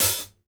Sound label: CLOSE, HI-HATS; Sampleheads; New York City Drumworks VOL-1; SP HI-HATS